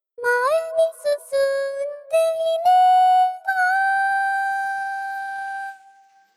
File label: Vox Hamo oFF